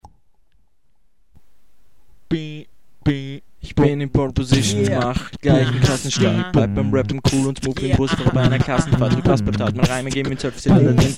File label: beatbox